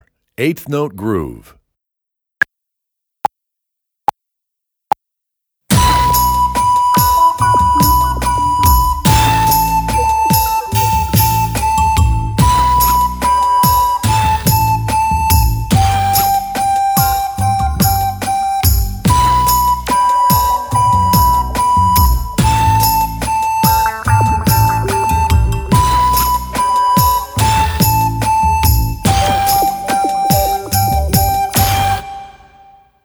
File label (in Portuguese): Eight Note Groove Com Flauta Guia, Flauta de Bisel; Nivel 1; 1.o Ano de Escolaridade; Obra Obrigatoria; 9o concurso de flauta de bisel municipio de redondo